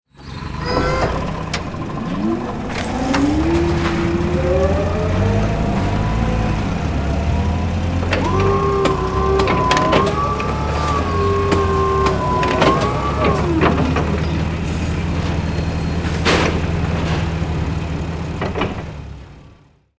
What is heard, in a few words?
Forklift digging worksite operation